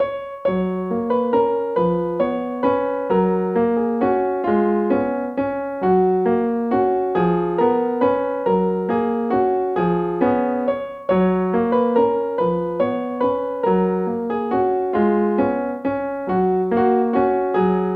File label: in g flat; the street of laredo